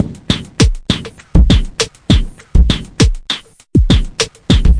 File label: 100 click thud rider, drum loops and breaks; 091 to 100 bpm